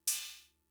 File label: Cymbal; Fricke MFB-522